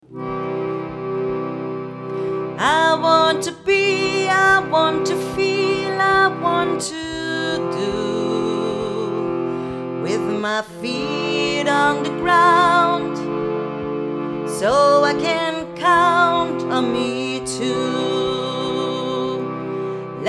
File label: Chakra mantra